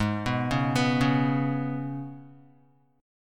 G sharp Minor Double Flat 5th G sharp, Guitar; G#mbb5; arpeggiated; JGuitar

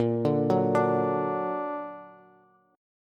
Bb Augmented Bb, Bass; arpeggiated; JGuitar

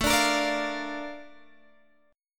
Bb Major A, chord; Bb/A; strummed

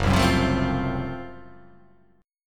F sharp Minor Major 7th Flat 5th F sharp down

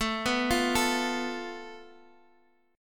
A Suspended 2nd Sharp 5th, Guitar, Half Step Down

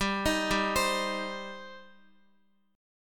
G Suspended 4th Sharp 5th G, Guitar; Gsus4#5 arpeggiated

